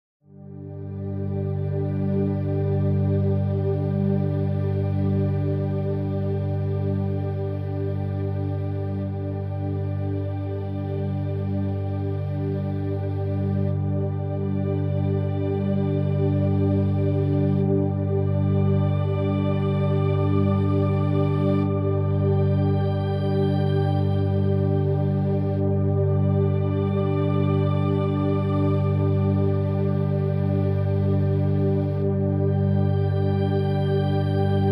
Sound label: Synth Only